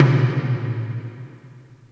tom3, drums

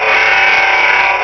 g maj7, guitar chords

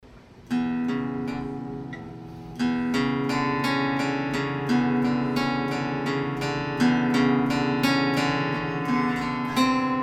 temporary frets

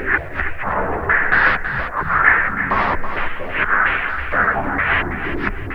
rand noise, korg

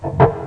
noise

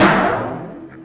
tom

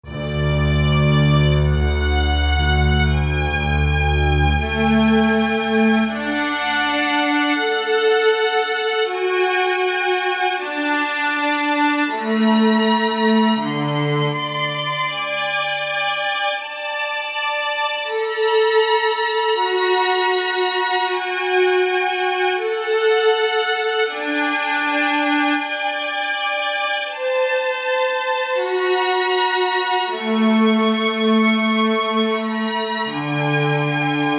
ho hum overtone, overtone music; micro

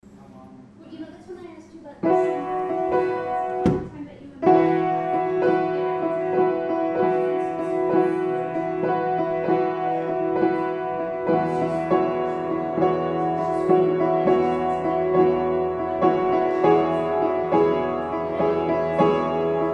piano

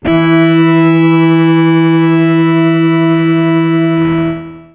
high e, guitar single notes

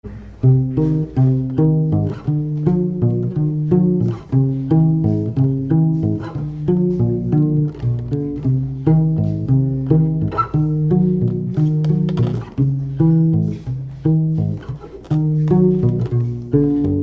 guitarron

